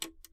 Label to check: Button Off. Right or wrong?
Right